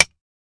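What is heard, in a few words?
Mouse Trap Single Snap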